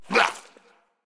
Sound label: hitreact small; minion s8; smite; npc; roman archer